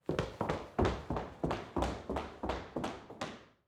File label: Foley Footstep Cowboy Boots Running Away On Tile; Foley Footsteps